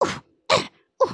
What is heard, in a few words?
soccer; emotes